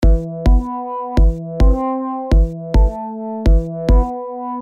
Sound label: Piano